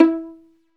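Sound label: STR Violin Pizzicato, Solo Violin FX; Roland L-CD702; Solo Vln FX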